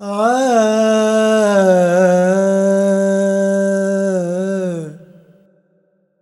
Voice, Vocals Male, World Pop; Sonic Foundry (Sony Creative Software)

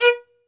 Synclavier Strings, SOLO VI; ILIO

